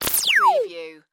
Descend Laser Shot

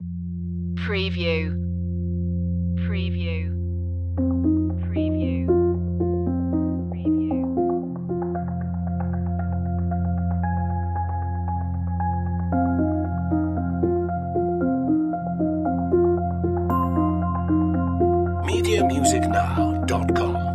a life begins no guitar

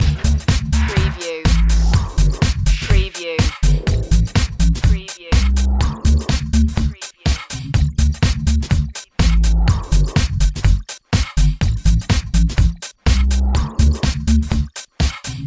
feel the beat loop

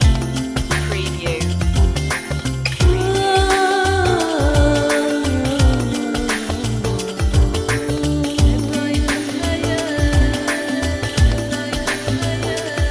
electro world wide

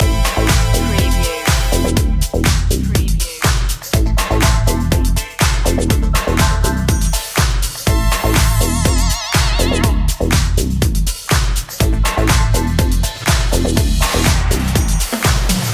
back in fashion loop

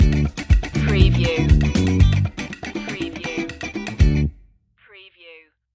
stinger

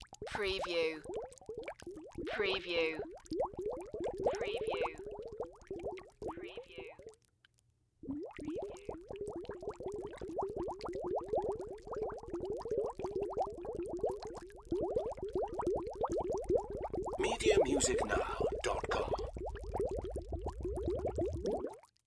Wine Bottle Submerge; Sound Effects Bible

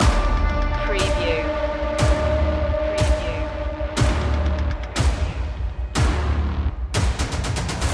attack force loop

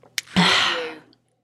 Fem Satisf Exhale

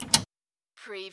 Servo Panel Sound - Switch TV

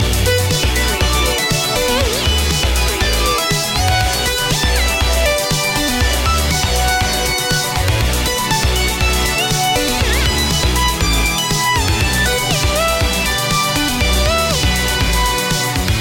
super chip world loop

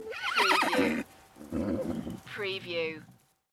Horse neigh young foal whinney

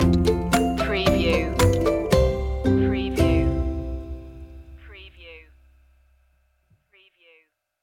clap skip jump stinger

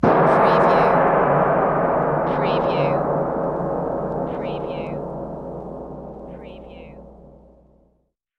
Gong Big Chinese Single

